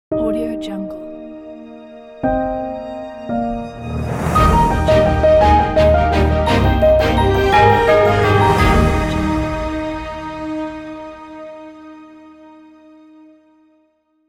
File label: cinematic piano logo music